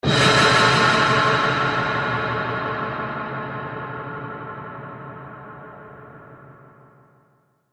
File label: Epic Impact; Miscellaneous Impact Sound Effects